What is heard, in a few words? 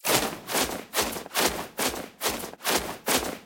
near win whoosh loop; silverback